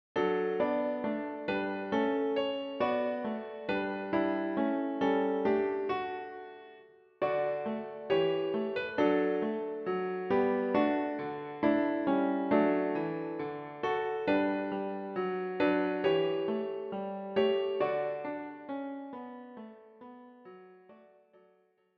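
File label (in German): Joy to the world Klavier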